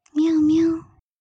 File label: Meow Twice